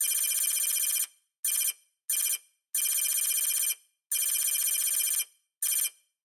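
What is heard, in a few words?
electronic sounds of data transmission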